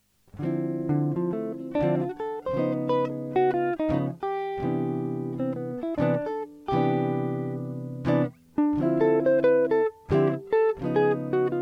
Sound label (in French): substitution tritonique